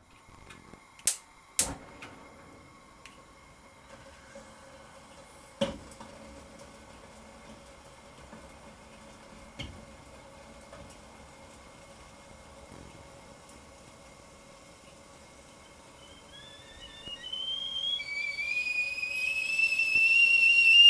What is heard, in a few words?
Whistling Gas Kettle Coming To The Boil